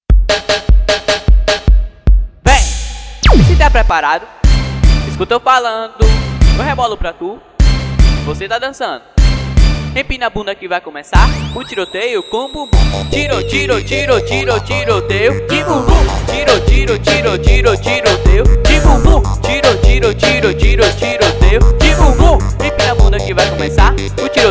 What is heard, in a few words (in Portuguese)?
swing